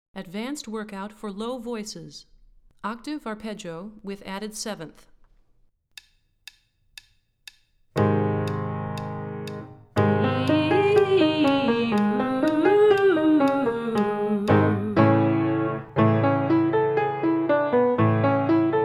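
Octave Arpeggio With Added Seventh - Low, Vocal Warm-ups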